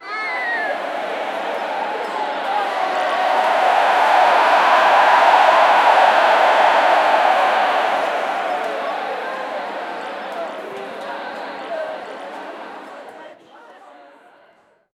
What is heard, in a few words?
a crowd concert outdoor